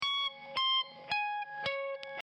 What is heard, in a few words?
click noise; logic